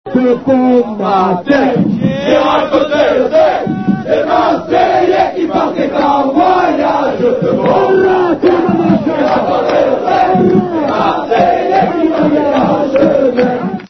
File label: train